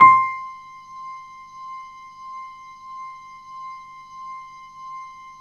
VOX PIAN; MORPHEUS

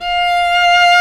Vla F4; Digital Sound Factory Virtuoso

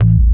BASS, Drum & Bass, 168 BPM; Ueberschall; Drum & Bass Flash Trackz

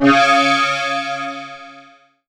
C#, BELL GONG, Synclav FM; Big Fish Audio

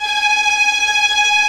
TREM VIOLINS

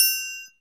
triangle, percussion

